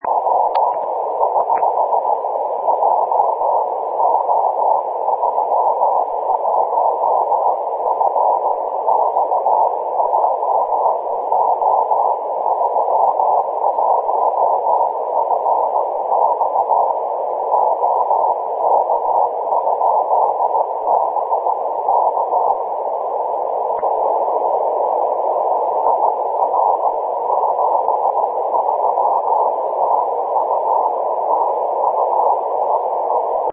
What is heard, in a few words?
24GHz